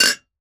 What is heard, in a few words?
Soft Clank Quick Clatter